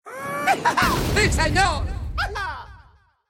gambler symbol pepper; Fruit Fiesta; wazdan

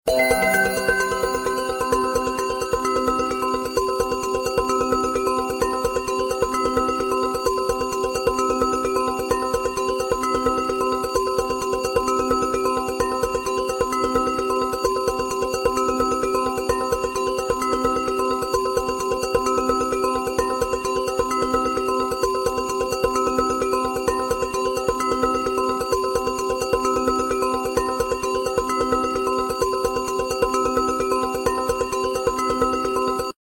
collect go; Cash Runner

